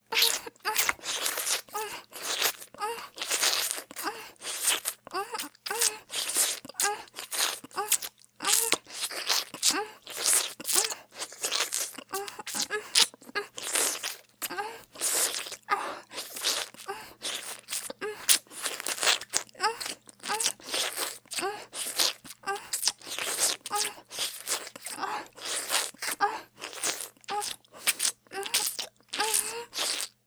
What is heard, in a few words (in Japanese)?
激しめに フェラ, 淫乱系 音声素材; Honey Drop